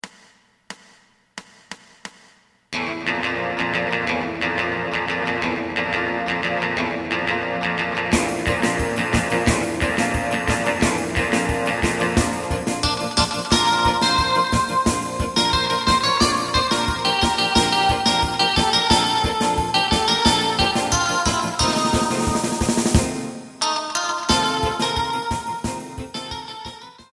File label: bass